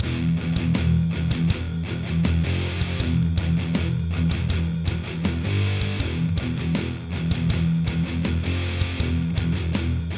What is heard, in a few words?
E Phrygian Vamp; loops